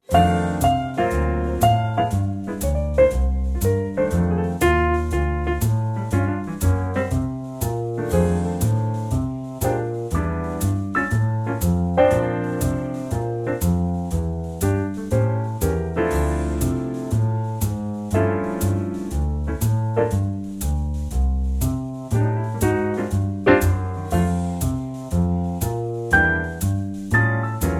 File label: F